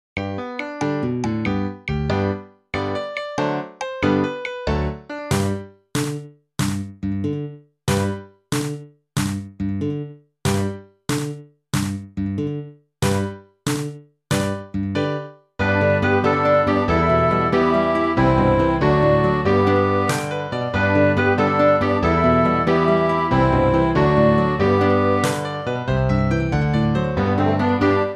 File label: midi